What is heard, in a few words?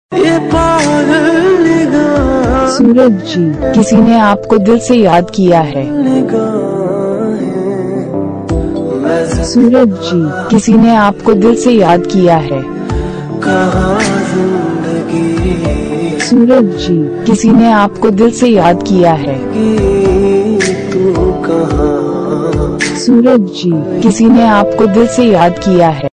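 Love Ringtone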